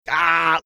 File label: aaagh